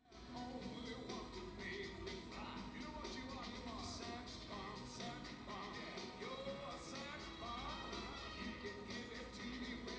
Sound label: music unmix